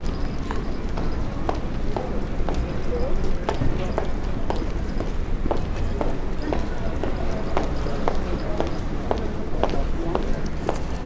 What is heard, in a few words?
ambience urban town pedestrians walk talk; sound effects one